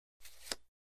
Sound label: Card Pick Up; casino games card games